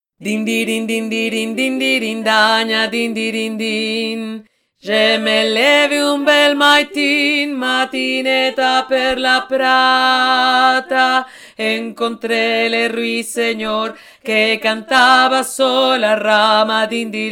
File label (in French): DINDIRINDIN VOIX GRAVE